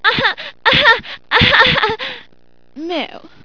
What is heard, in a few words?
moo